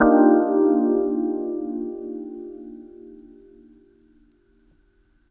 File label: DX200 E Piano Vinyl Synths Cmin711 C2; vinyl chords